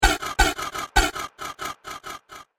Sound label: Strange Wind Machine Sounds; Miscellaneous Cartoon Sound Effects; fantasy sound effect; Andrew Fleming